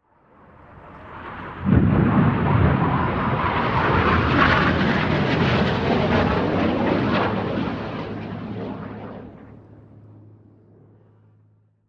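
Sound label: Plane Hawk overhead By Jet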